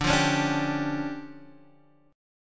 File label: D Minor Major 7th Double Flat 5th, D, Guitar Half Step Down